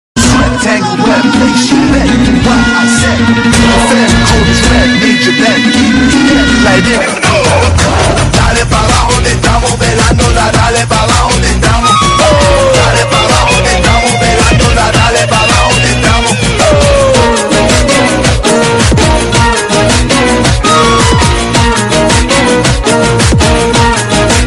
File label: trending bgm ringtone; real star upendra; uppi 2